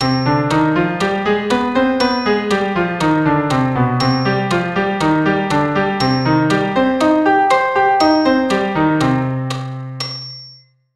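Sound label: ex5 C minor harmonic, keyboard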